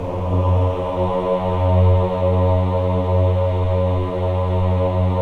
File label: CHO AH, Choir of Ahs; Roland - LA Composer vol. 1